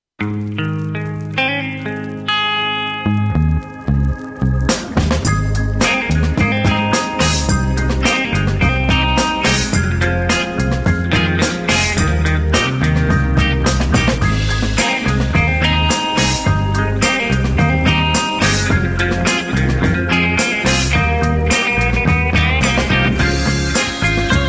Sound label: memphis guitar soul